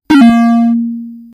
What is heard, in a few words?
zap Three Tone Down; kenney digitalaudio